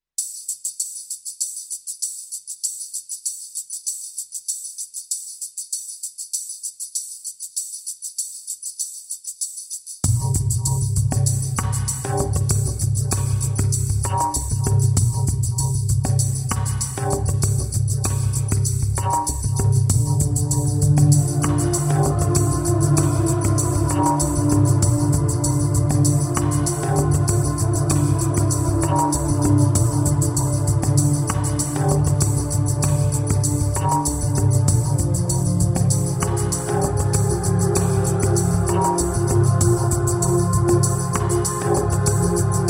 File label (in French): AMBIANCE SUSPENS